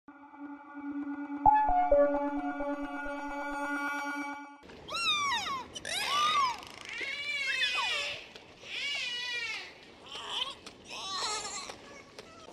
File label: Dolphins Therapy Sound Effect